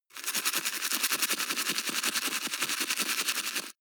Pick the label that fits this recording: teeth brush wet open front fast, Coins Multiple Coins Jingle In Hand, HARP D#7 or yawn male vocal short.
teeth brush wet open front fast